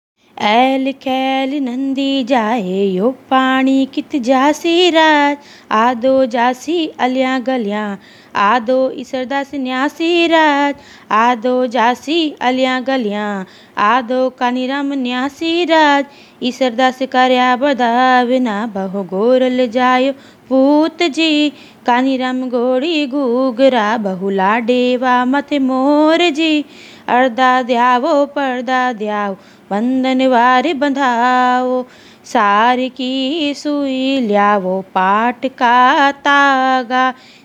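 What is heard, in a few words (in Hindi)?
एलखैल गीत; गनगोर गीत; एल खैल नंदी जाय, यो पाणी कित जाय सी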